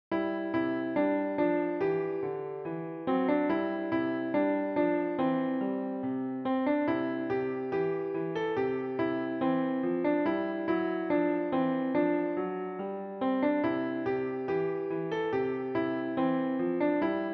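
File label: piano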